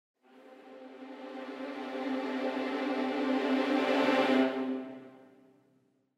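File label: Pre Orch Ens 16 Violins 10 Violas 8va Trill Whole Tone; Audio Imperia Areia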